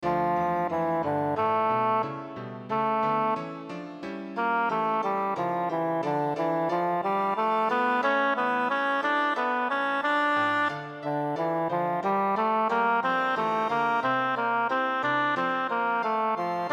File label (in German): aria con coro ohne chorstimmen; bach johann sebastian; johannes passion; 48 aria con coro eilt ihr